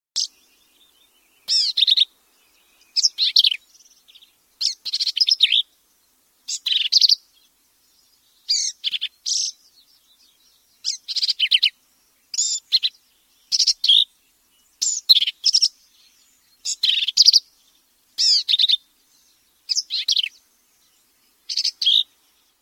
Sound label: Black capped Vireo